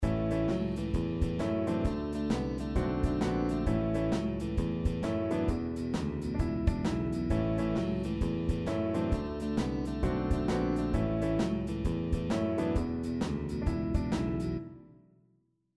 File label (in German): Bandpattern Blues; Popularmusik